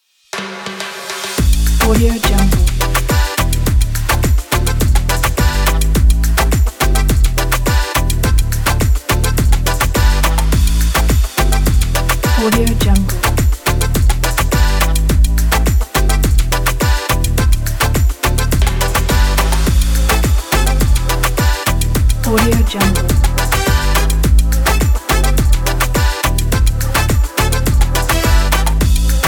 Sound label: sunny positive reggeton